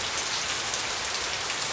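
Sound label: rain; Mafie 1 cz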